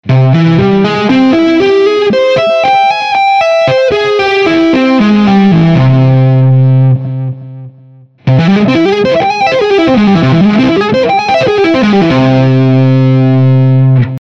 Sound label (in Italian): Do5+